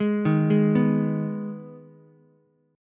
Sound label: G sharp Minor G sharp down Ukulele, chord; G#m arpeggiated; JGuitar